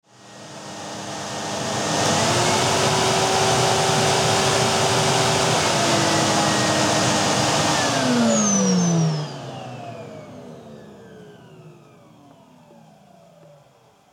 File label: robot